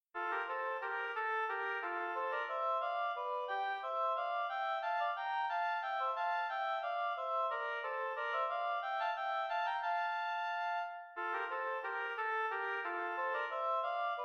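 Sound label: air en rondeau 2 oboes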